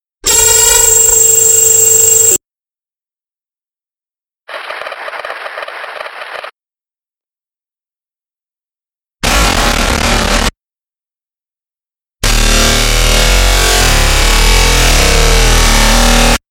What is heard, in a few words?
glitches noise hell